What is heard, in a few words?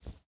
Forest Moth Wings